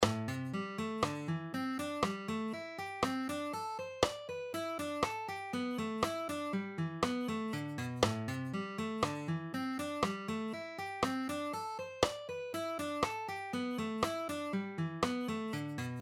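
String Skipping Exercises Half Speed, A Minor And E Minor Pentatonics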